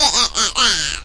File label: evil laugh, male; MALE MISC and SPOKEN WORD